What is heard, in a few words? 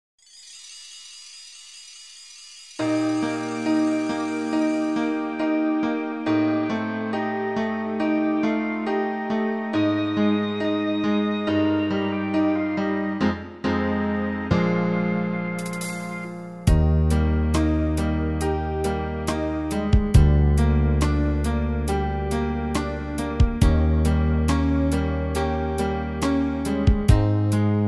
taiko